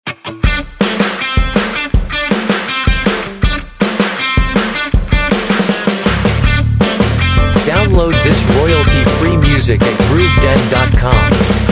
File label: Upbeat rock-n-roll featuring sizzling guitar, energetic rock drums and smokinh guitar solo; Positive, upbeat